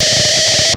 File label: BRAKES, PHUZZ DRUMS; Spectrasonic Distorted Reality 1